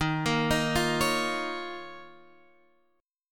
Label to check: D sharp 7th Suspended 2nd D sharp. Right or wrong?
Right